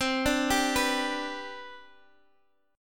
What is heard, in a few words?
C Major 7th Suspended 2nd C down Guitar DADGAD